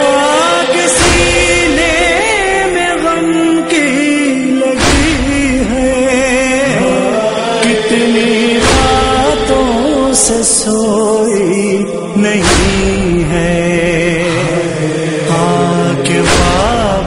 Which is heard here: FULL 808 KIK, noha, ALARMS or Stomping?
noha